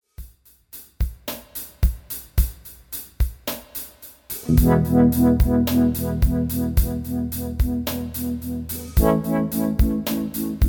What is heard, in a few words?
eighth note trem filter